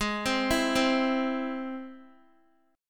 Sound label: Ab Augmented Ab, Guitar, 4E, 4A, 5D, 5G, 5C, 6F; x,11,10,9,12,x; Ab+ arpeggiated